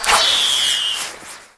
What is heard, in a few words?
flame arrow; AoM-ARTofMyth